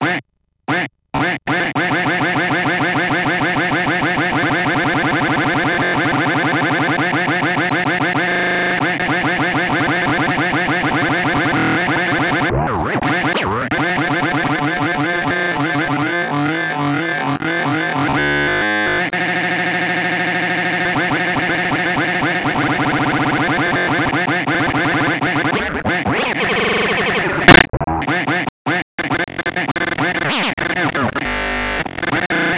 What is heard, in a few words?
QUACK